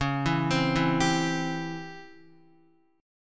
Db Minor Major 7th Flat 5th Db Guitar, chord; DbmM7b5 arpeggiated; JGuitar

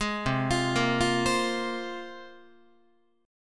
C 7th Suspended 4th Sharp 5th, Guitar

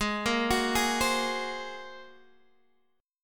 Bb 7th Suspended 2nd Sharp 5th Ab

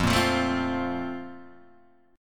A sharp Major 7th Suspended 2nd, chord

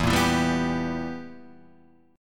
B Major 7th Suspended 4th Sharp 5th E

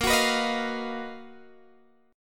B Major Sharp 11th Bb

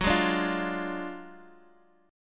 D Diminished F sharp

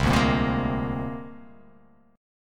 C 7th Suspended 2nd Sharp 5th, C7sus2#5 chord; C7sus2#5 strummed; JGuitar

